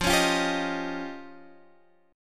F 7th Suspended 2nd Sharp 5th, Guitar, Half Step Down; F7sus2#5